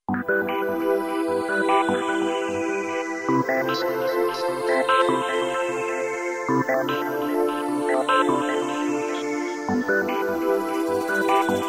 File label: Ambiance Newage